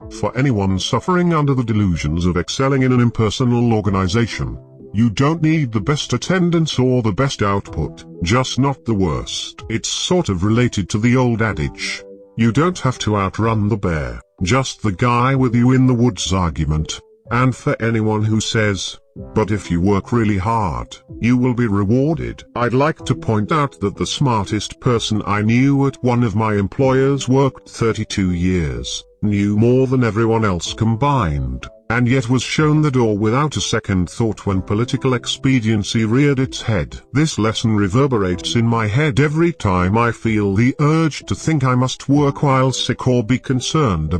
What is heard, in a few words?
banjo